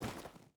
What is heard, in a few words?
footstep boots gravel walk; Rust